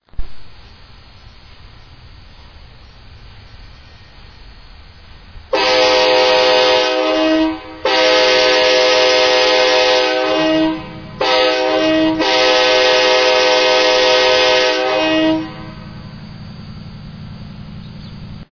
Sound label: Nathan K5LA, Horn